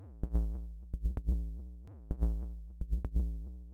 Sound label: analog drumcode like bass sequence Am; loops